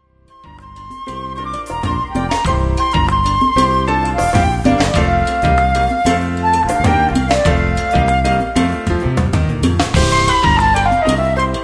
drum

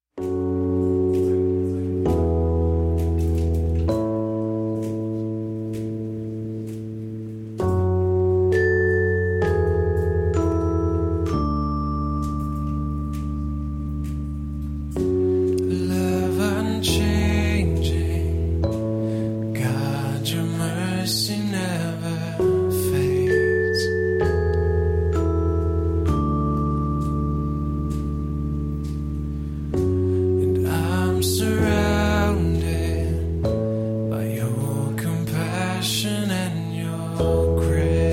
acoustic version

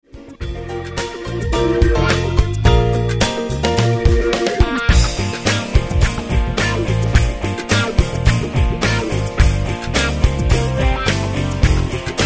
PLAYBACK Oh When The Saints Go Marching In

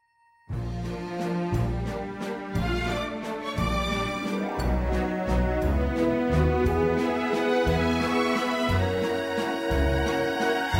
Jingle Bells

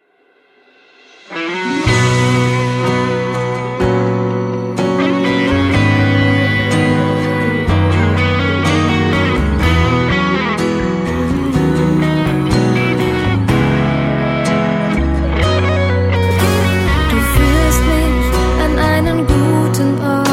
Gospel Celebration Choir

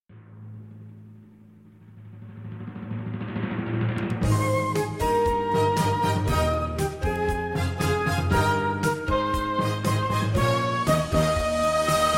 Intrada, Strings and Brass

